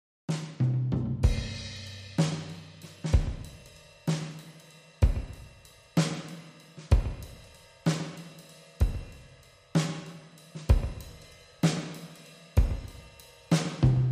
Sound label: Drums Clean